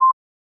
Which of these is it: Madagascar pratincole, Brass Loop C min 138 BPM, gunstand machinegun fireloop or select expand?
select expand